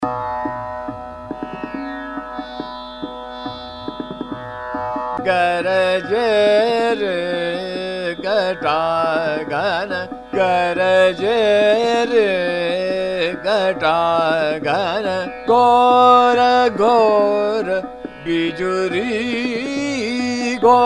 Chota Khyal, Ektaal Drut; Kedar; Garje Re Ghata Ghan

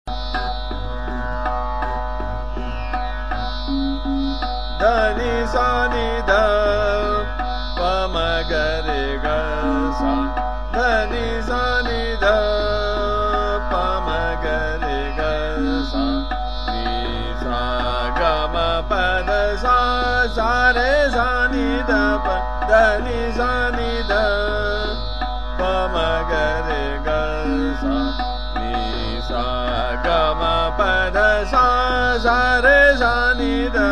Sargam - Trital, Mand

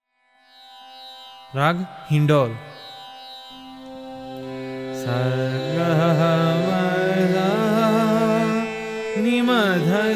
hindol, raga

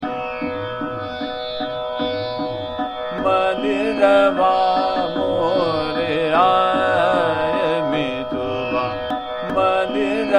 Chota Khyal, Trital; Ramkali; Mandarwa More Aaye Mituwa

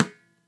khol ka

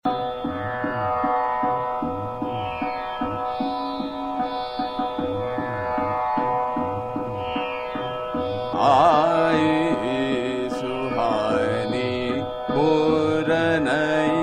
Chota Khyal, Trital; Basant Mukhari; Aai Suhani Bhor Nai

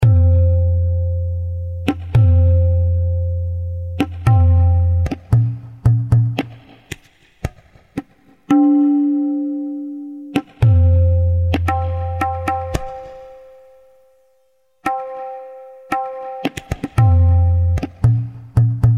ektal vilambit, tala

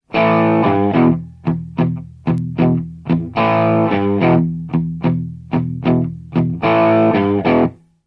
heavy blues

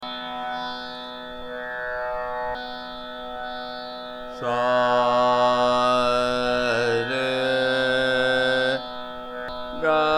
Aheer Bhairav Aaroh Avroh

